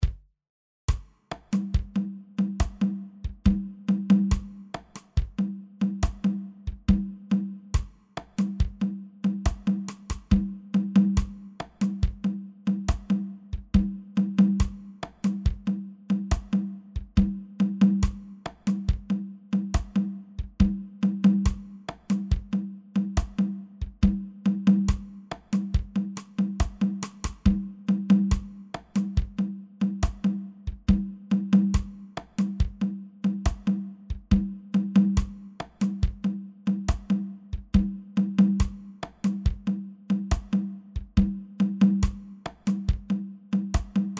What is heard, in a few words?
Kwela Congas